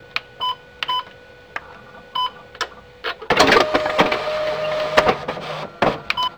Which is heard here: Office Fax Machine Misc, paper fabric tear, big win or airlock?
Office Fax Machine Misc